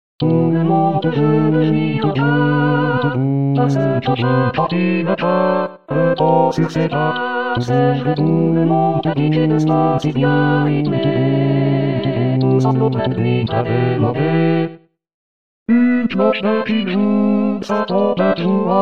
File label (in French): Les cats Basse; Variété